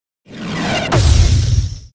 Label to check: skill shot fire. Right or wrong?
Right